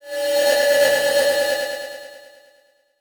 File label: Transition Tremolo; Production Element; Title Transition; AdobeCC